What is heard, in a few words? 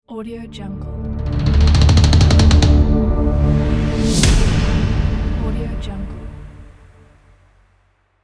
cinematic epic logo